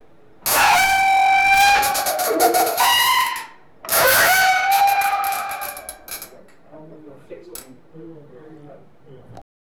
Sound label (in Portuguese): som de uma montanha russa, amusement park